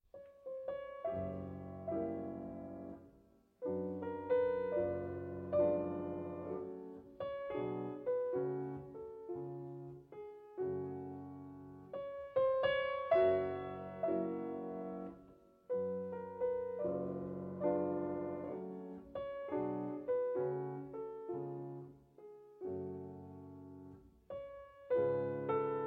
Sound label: Nocturnes in F-Sharp Minor